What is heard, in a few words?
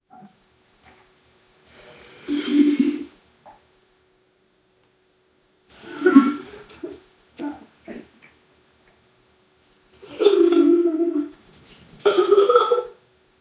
woman pooping and gruntin; bathroom